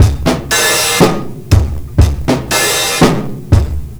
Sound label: R&B Drums